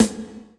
TIGHT SNARE, M1-M3R; M1-M3R KITS; Greytsounds VOL-1

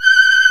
CHOIR A4; AKAI S-Series CD-ROM Sound Library VOL-2

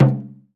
China Drum; EMU Planet-Earth

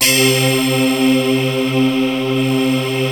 FM FAIRLITE, SYNTHS; Kid Nepro; Elite

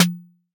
Snare C, Hip-Hop Drum Kit; 99Sounds x Monosounds; Moon

